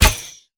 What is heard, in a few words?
archer shoot arrow; Aragami